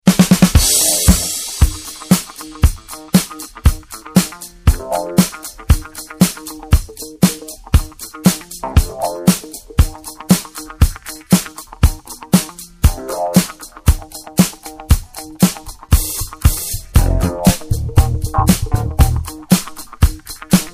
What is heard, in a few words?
Disco Drops